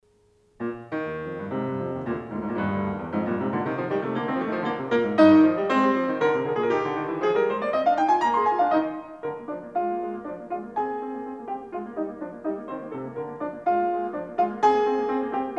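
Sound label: Piano Sonata No. 13 in E flat major; Wilhelm Kempff; Beethoven; Piano Sonatas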